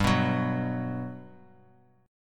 G Major 7th, G, down; strummed; JGuitar

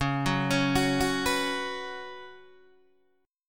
C sharp 7th Suspended 4th C sharp, Guitar Open D

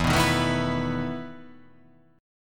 E Major 7th Suspended 4th

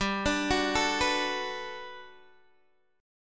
G Major 9th, G, down, Guitar, DADGAD

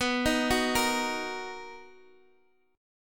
D sharp Minor B, Guitar

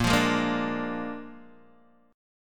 Bb 7th Bb down Guitar DADGAD

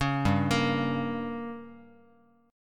G Diminished, Guitar; arpeggiated; JGuitar

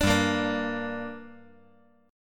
G Minor G down, chord

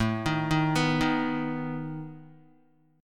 A Suspended 2nd Flat 5th A down Guitar; Asus2b5 arpeggiated

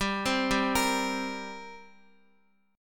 G-Minor Double Flat 5th G down Guitar; arpeggiated; JGuitar

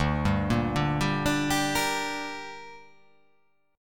D Suspended 4th Sharp 5th D, down

